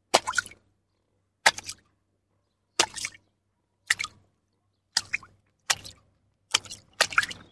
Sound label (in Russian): zvuki shagov cheloveka po vode